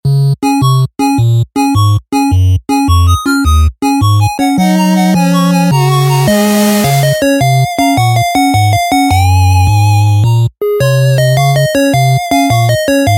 8 bit; my little pony; find a pet

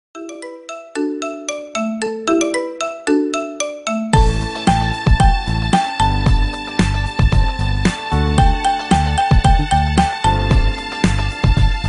marimba ringtone remix

